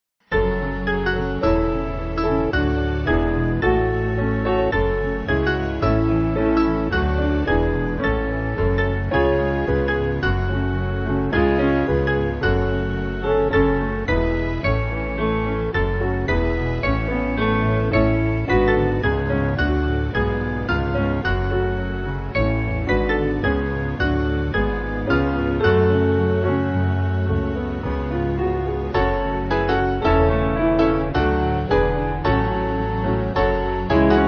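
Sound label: Band, Music Backing Files for Churches; While By The Sheep; Jungst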